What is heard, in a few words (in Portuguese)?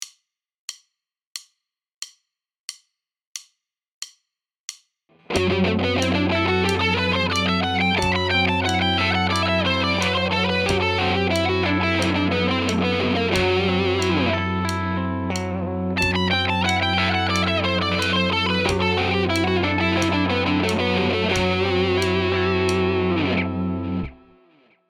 locrio, guitar